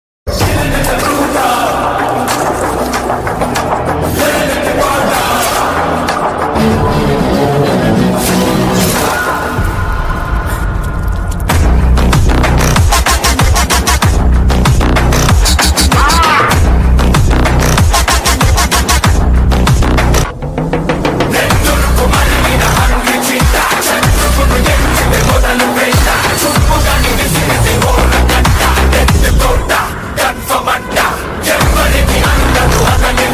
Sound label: Bgm Ringtone; OG Glimpse